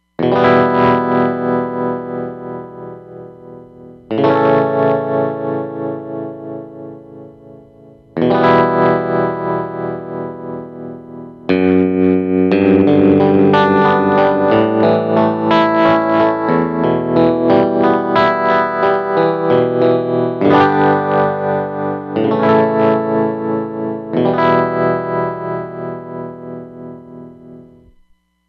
Trem o Vibe, Roland Fantom XR

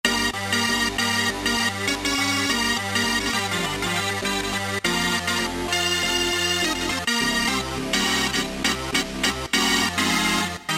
LO-FI ORGAN, Roland SC-880; Factory Patches

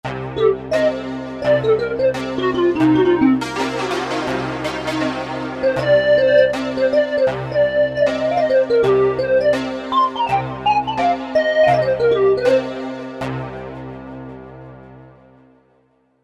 Japanese, Korg MSC-1S; Korg MSC-1S Variety

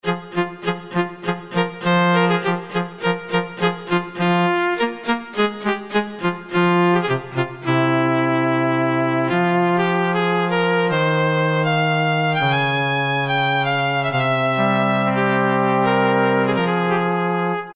String Quartette, Roland PN-D50; Roland PN-D50-03

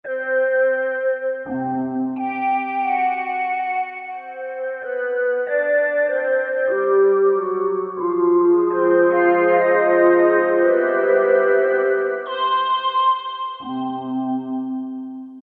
Ping Wave, Korg M1; Factory preset examples

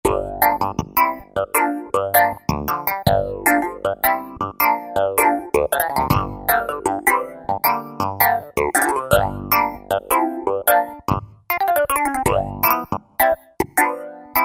Throaty Clav, Roland JD-990; Factory Patches

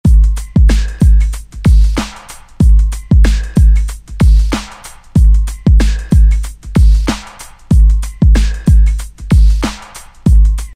909 94, Roland SR-JV80-06 Dance

